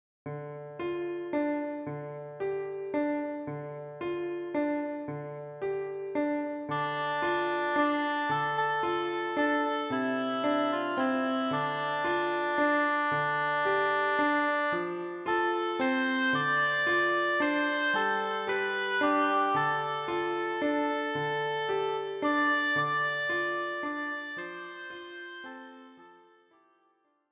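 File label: Scarborough Fair Oboe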